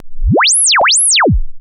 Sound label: Laser Zap; lazers